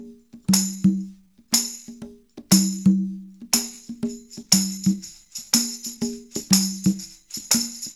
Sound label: SD tam con; Percussion